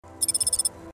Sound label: missions update; cloudpunk